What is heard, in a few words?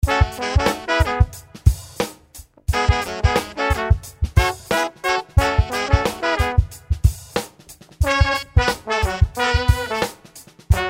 Hot Horns